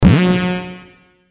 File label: Guitar Gun Shot; Guitar One